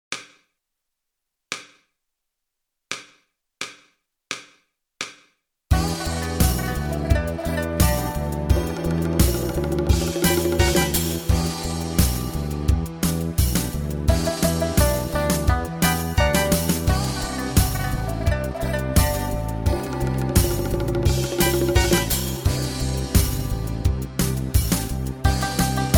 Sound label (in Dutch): Gitaarboek Chinese Take Away; Go for Guitar Basic